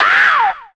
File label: ow, Voice ooh ahh ow ouch